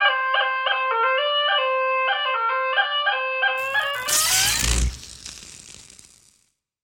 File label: Music; TR4; Game Charmer